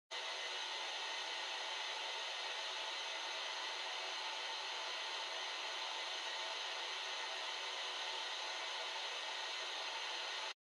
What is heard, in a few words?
Radio Signal Noise Far FM